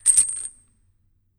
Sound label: Damned Tourment Chains